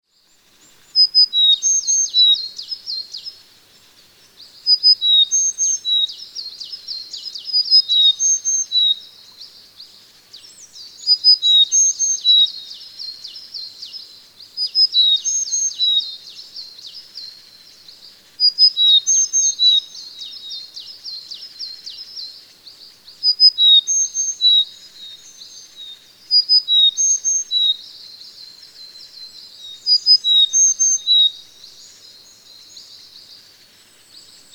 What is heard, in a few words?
kogara; コガラ; さえずり; 2023年5月12日 静岡県静岡市